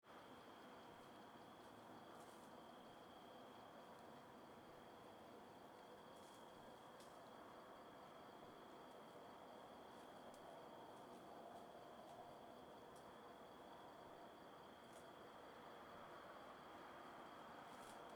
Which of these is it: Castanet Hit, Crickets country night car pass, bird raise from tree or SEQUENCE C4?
Crickets country night car pass